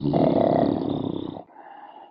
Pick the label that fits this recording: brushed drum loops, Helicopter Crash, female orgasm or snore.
snore